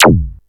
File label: SQUONK; ELECTRIC-SET